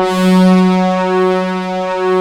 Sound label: BIG MOOG; East West - Global Sounds